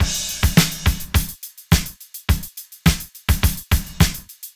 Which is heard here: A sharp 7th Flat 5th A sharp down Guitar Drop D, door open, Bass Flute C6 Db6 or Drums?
Drums